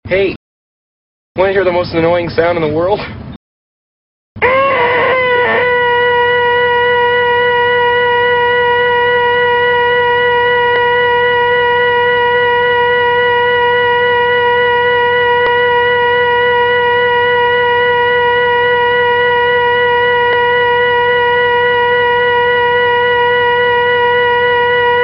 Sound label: Noise